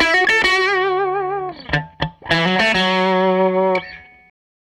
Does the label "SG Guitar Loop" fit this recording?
Yes